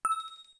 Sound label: End, Keytone sounds, Piano; LG